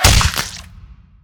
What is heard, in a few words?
impact, violence